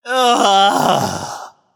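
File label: nonverbal ugh; smite; voicepack; merlin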